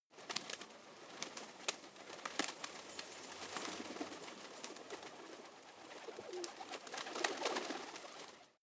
pigeons flutter short; natur-tiere; voegel

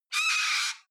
cockatoo squawk

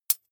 midsize metal thin hit

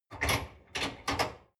dishwasher frame fold down; maschinen; spuelmaschine

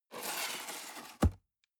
cardboard box open scrape; objekte

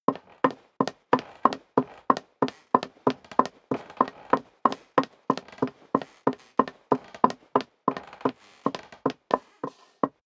step high heels wood creak run sequence

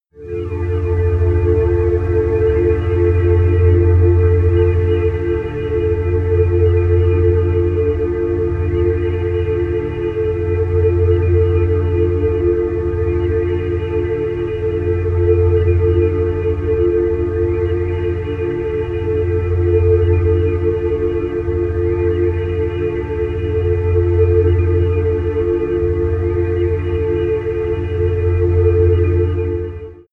space stars, music elements

